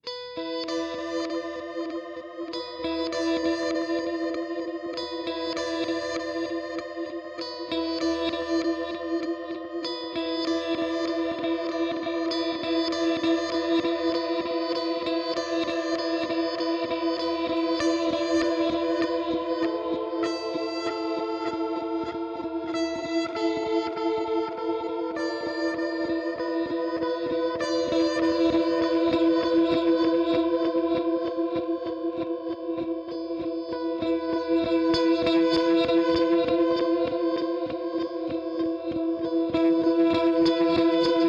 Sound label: Red Panda Raster V2 Shift Detune